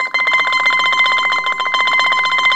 ELBZ TREM, BOUZ ELTR, Mediteranean Traditions; USB Soundscan vol.53; AKAI

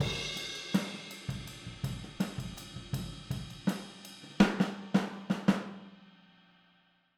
Boogaloo - Outro - Overhead, 82 BPM; Sonic Foundry; Drums from the Big Room The Mixes

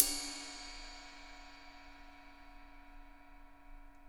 ride; Sonic Reality; Interactive Drum Kits; 90s sample cds

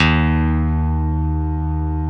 PNO E.Grand; Roland; Keyboards of The 60's and 70's

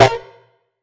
acid synth (chord); Single Shots; Sonic Foundry (Sony Creative Software); Ugly Remnants VOL-2

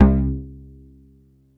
Juno Low C2, Bass Analog & Synth, Vintage; USB Soundscan vol.12; AKAI; 90s sample CD

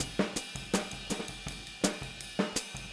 Boogaloo Chorus Hi-Hat, 82 BPM

